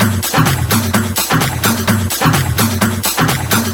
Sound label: Percussive Loops 128BPM; Vengeance Samples